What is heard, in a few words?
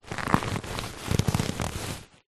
handbag leather rigid handle short